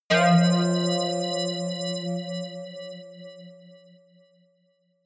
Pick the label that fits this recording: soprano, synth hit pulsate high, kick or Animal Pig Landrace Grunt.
synth hit pulsate high